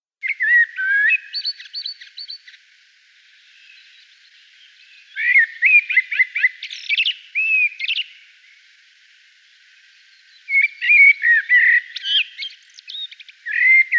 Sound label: Turdus merula